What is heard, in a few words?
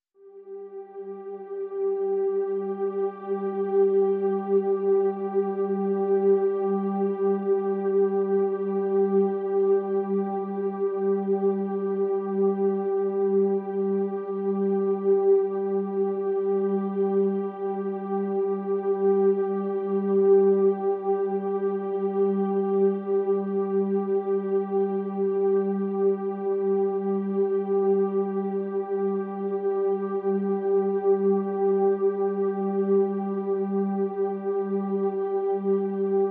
Pads Ethereal Mist (G)